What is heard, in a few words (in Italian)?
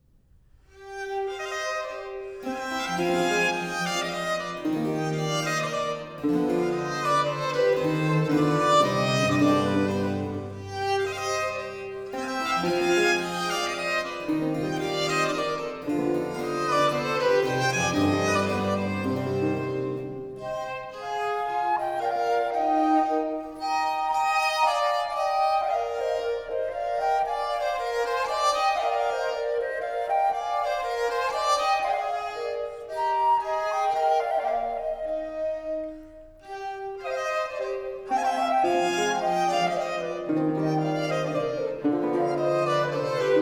flauto traverso; 6 Concerti; Op. 10, No. 2 in G Minor